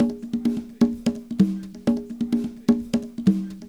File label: con; Percussion